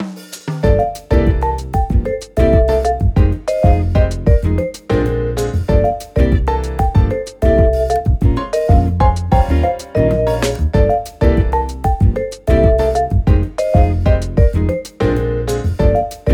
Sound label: BGM Draw; Clubhouse Games: 51 Worldwide Classics